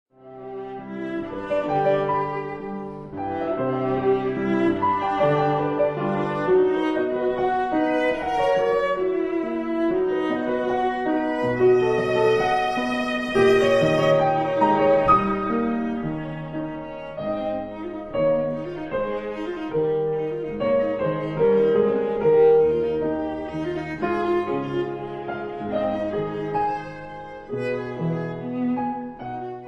Phantasiestucke For Viola Piano Allegretto con delicate; Fuchs; Op 117; Allegretto con delicatezza